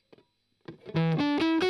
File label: Strum Octav Arp